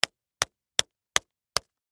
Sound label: Cigarette Box Pack, Foley, Cigarette, Box, Pack, Against, Hand, Smack; Smoking